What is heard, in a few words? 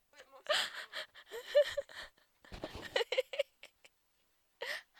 Laughter; thornfield room hall